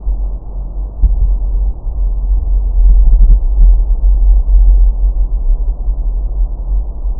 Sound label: M5 Alaska, seismometer; diy home seismometer station